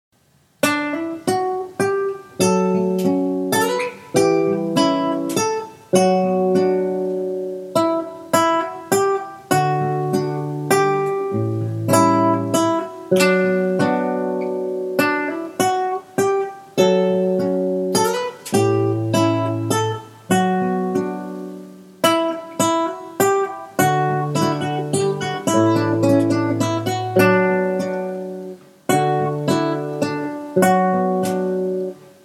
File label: sad saltarello